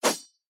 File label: Sword; Minora-Game; Sound-Artlist